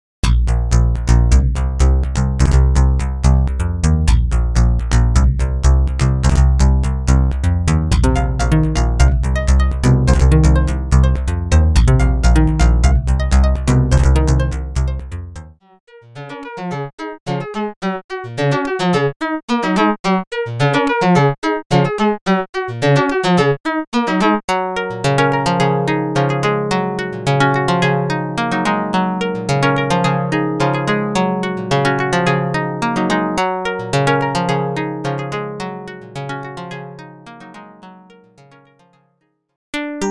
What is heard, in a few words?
riffs and loops, synthesizers